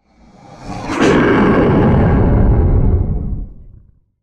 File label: Hit Devils Lightning; Hit Sound Effects; BlastWave